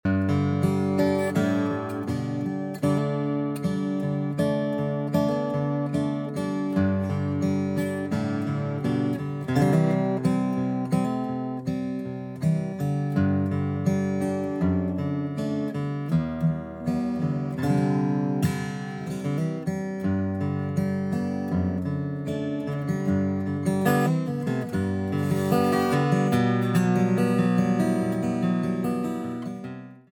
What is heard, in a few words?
Dreadnought sample, guitar